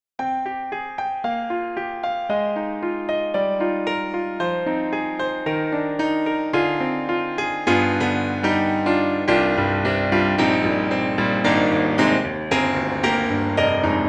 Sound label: Roland Juno-Gi Juno Piano